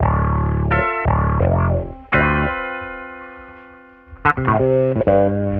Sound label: guitar, Loops; Phonky